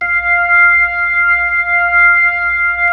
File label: B-3 Club Xfade, Keyboards of The 60's and 70's